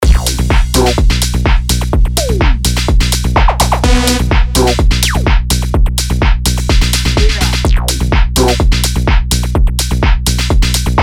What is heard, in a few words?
synth shots tech house; revealed recordings